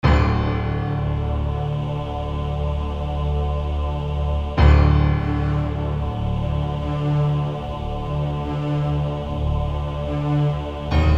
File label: Orchestral Phrase; FXHome